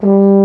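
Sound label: baritone G2 horn; InVision Interactive; Lightware VOL-1; Instruments & Percussions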